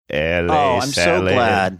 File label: singing la salad